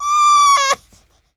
CRY, baby

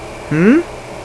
"HMMM"; gemiti esclamazioni mugolii

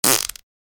fart; rutti e scoregge

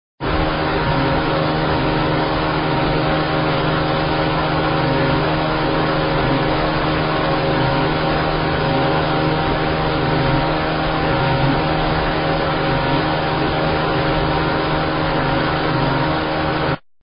High Speed Boat; trasporti; navi

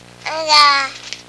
BABY GOO

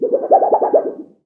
WOBBLE, cartoons

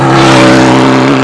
MOTORBK; trasporti

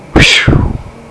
WHEW

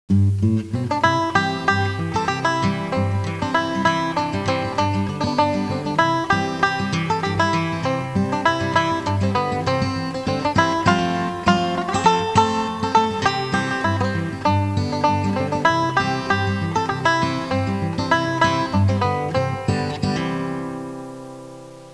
suonerie musica traditional usa country; american

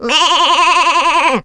pecora sheep; animali

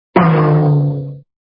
Gong, cartoons

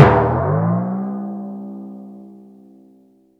TIMP, cartoons

